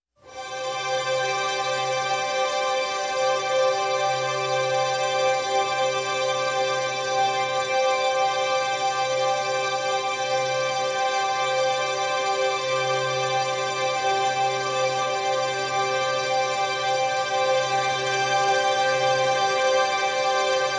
Collective Strings C

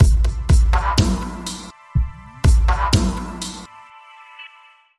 Link Sting

